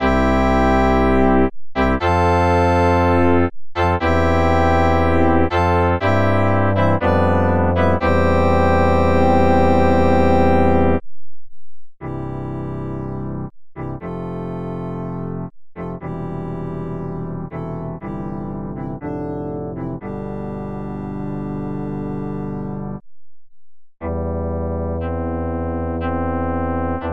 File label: Synthetic music, Csound; Csound orc 與 sco製作:曾政傑